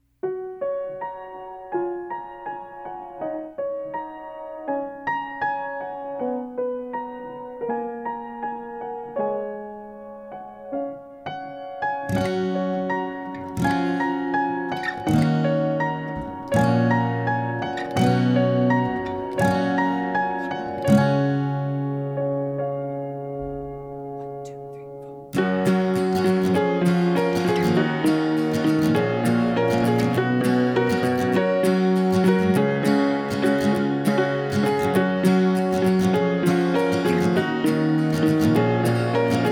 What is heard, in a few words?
Acoustic Cover; Everfree Radio